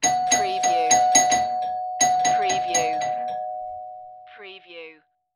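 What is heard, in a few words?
Door Bell Fast Repeat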